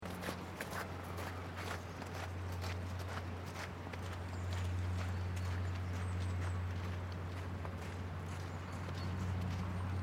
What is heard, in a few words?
Residential Area Rain Snow Footsteeps Birds; Semi distant recording of a quiet residental area. Airy feeling. Footsteeps in wet snow passing, some slush